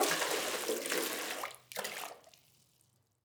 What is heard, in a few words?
waterbucket fill container; Rust